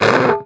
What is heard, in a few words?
Smash